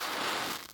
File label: footstep lava